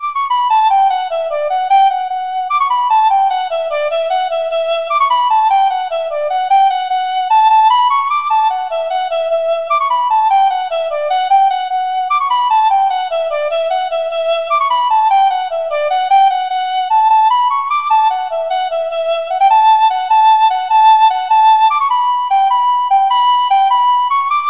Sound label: tin whistle